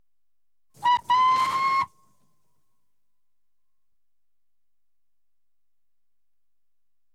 Steam Launch, Hooter; Ships And Boats; Victoria; BBC Sound Effects Library; Ships And Boats 2

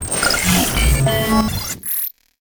transforming element; Bluezone; Transformers

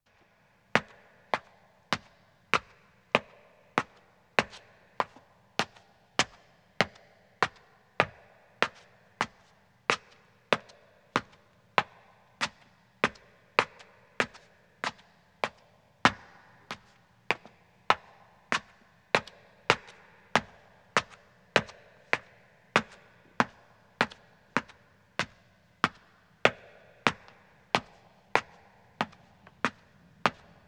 Stone Stairs (Echo) Ascending, Footsteps; BBC Sound Effects Library; Footsteps II

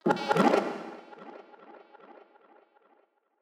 Tech, Weird Side Samples

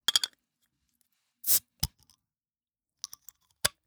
Cap Beer Bottle, Open & Close; SoundBits

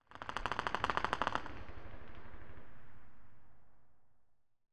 Warfare, Rapid Fire, Distant; BBC Sound Effects Library; Explosions Guns Alarms

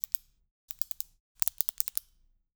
Pen, Click; LukasTvrdon; Design Source 192

